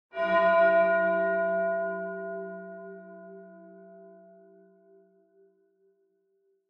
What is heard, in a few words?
Church Bell Far High Short